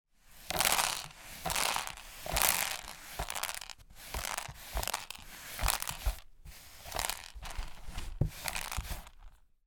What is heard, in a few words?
food popcorn kernels sweep up from carpet pan brush; zapsplat